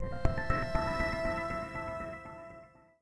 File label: Future, notifications; Samsung; Galaxy J3 Prime